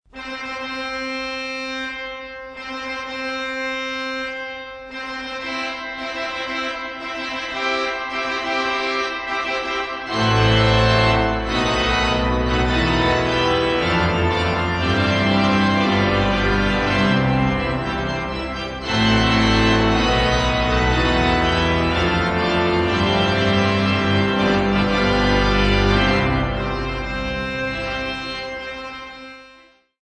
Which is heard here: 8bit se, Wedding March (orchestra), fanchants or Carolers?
Wedding March (orchestra)